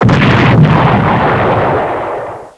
explosion mortar; Army Men TiS

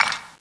wood deb, impact debris wood

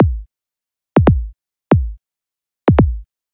TECHNO

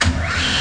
SWITCH; Total Chaos Nukem 3d Pack; duke

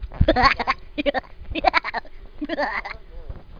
giggle; Hellboy; CD Expert nº 41

